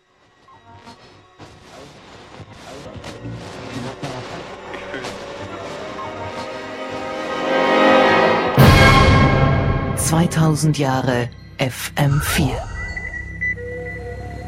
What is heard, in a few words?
non english song